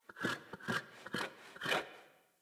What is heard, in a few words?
Freed Fawn Call; Encounters; Animal Rescue